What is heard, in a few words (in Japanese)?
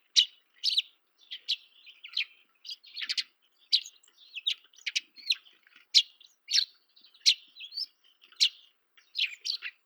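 suzume call, sparrow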